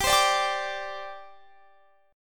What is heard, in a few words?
G Suspended 4th, Guitar, Standard; Gsus4 strummed; JGuitar